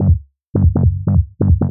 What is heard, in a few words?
TECHNO BASS 140 A